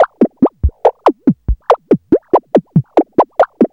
MACHINE BEAT; Zero-G Ambient 1

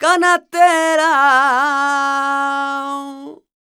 voc c#, Flamenco, Vocals, Female Cantaora; ProSamples vol.46

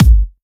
Trance Kicks, Bassdrums

